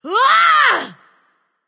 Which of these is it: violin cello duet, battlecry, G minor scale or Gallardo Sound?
battlecry